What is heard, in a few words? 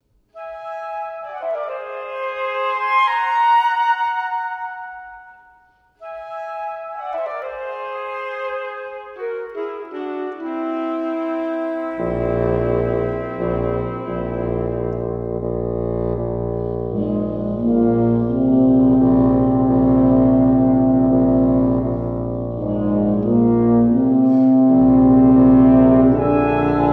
Windscape